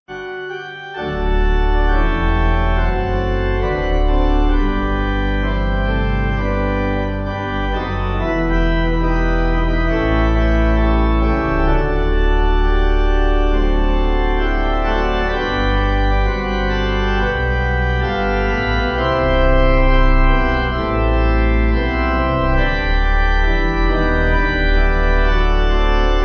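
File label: Pipe, Pipe Organ backing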